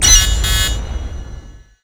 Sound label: player exit warning; coop player exit warning